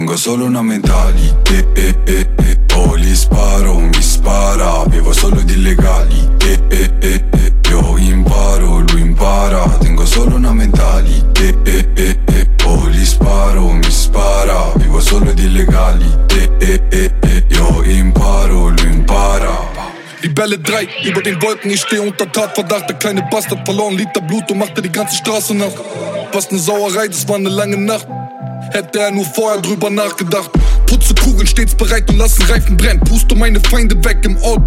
low bass, 3741hz; в машину

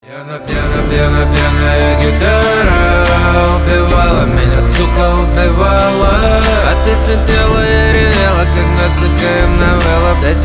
pianaia gitara

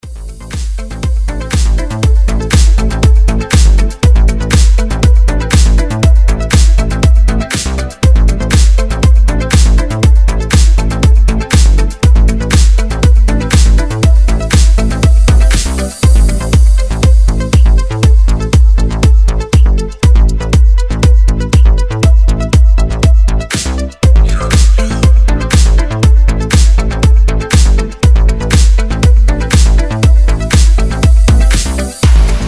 deep house

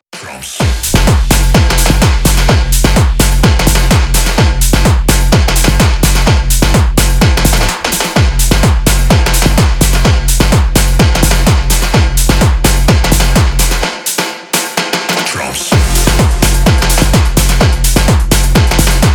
drumz wave; edm

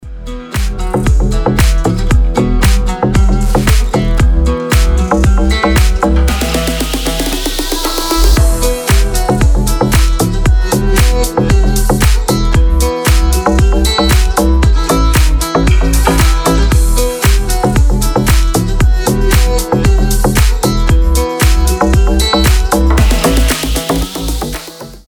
beauty istanbul, турецкие

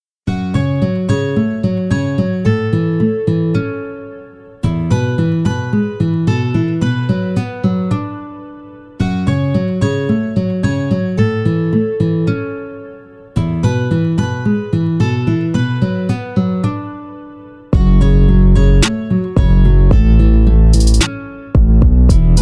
nba instrumental; rok metal

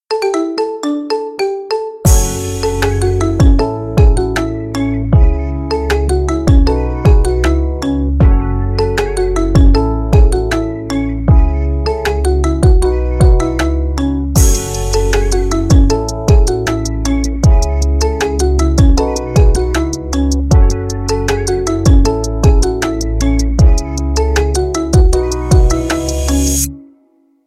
marimba remix

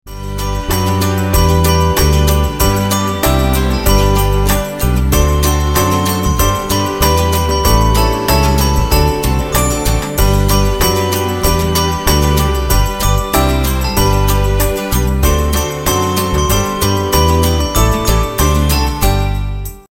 jingle bells; prazdniki-pozdravleniia

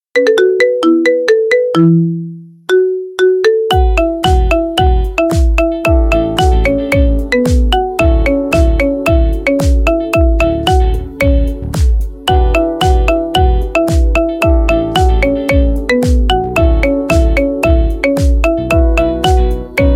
marimba remix

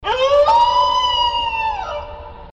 dingo, звуки животных

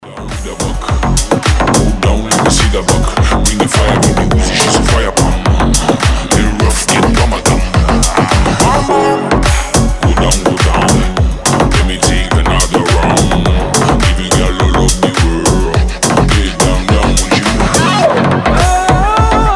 arabic reflection, g-house

